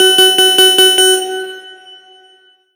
Extend Short; Pac-Man Championship Edition 2 Plus

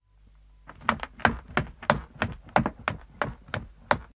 Footstep Stairs short

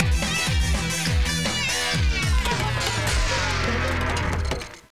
Failure Sting

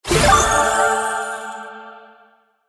Level Up Full SFX

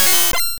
Metal Iron Claw Tail Start; attack moves; pokemon; gen 2; gsc

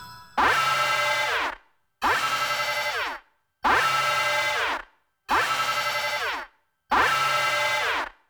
WARNING; Darius

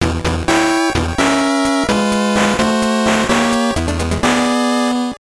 Battle Intro; power rangers lightspeed rescue; gbc

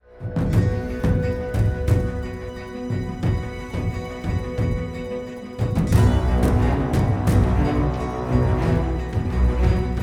Ethno Theme Summer Full Epic Live

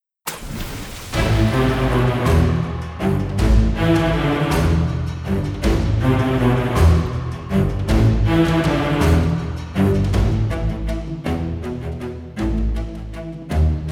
Remnant Waltz; Monster Train

